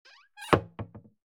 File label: Cabinet Close